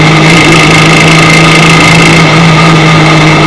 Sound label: Honda Coupe engine sound